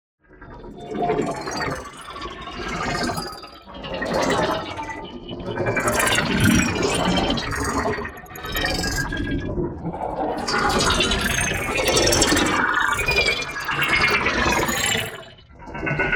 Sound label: PARTICLES LARGE SLOW Chunky Doppler; NEON; boom library